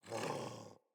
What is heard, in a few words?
Puppy Sleep Idle Snore; Enemies; Vocals; Puppy Sleep Idle Snore B; Kubrow